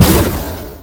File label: Eagle Launch; Harvest Massive Encounter